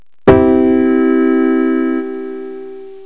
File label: PIANO